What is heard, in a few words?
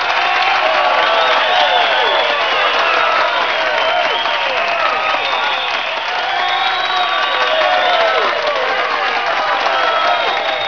Crowd cheering; Bedlam 2 1.1.1; Bedlam Sounds